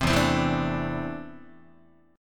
E 7th Suspended 4th Sharp 5th E down Guitar 4A 4E 5A 5D 5G sharp 6C sharp; strummed